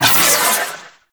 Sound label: Proj Tap Whoosh; weapons; Warframe; The New War; Omicrus